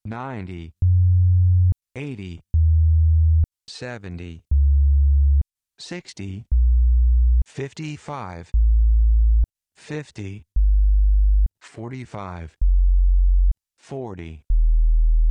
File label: Bass Tones